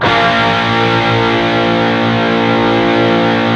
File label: PRS PWR CHRD B 2 L, Guitars